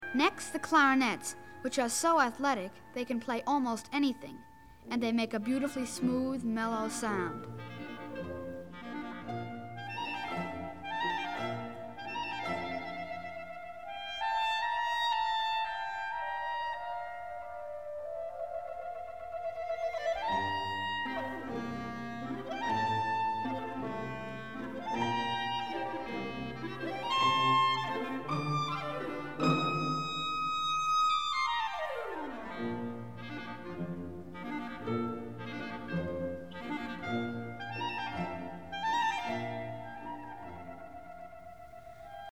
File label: Variation C Moderato; (Clarinets); orchestra; Young People's Orchestra